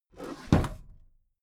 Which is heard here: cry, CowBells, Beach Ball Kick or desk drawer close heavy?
desk drawer close heavy